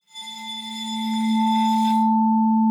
arco A3, vibes; Xsample VOL-4

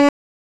Game Over loop; SNES; Dragon Ball Z Super Gokuden - Totsugeki-Hen

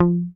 HOF MUT, Hofner mute bass

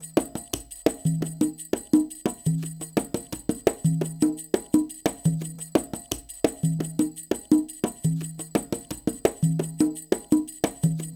Percussion, cinematic funk

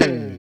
SLIDE DN, FUNK CHORDS, Guitars; Spectrasonics; Hans Zimmer Guitars VOL-1

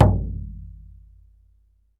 BLACKFOOT, American Natives; E-MU Formula 4000 Series Vol. 4; Earth Tones

